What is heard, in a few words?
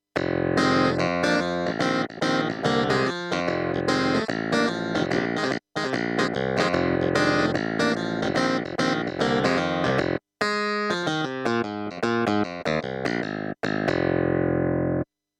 stagepianos kurzweil clavinet